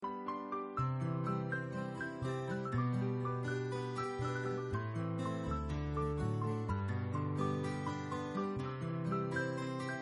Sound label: piano